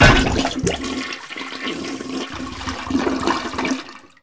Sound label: Hit Head Smack Toilet Flush; SFX Kit; Foley - Misc